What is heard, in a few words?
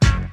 crasher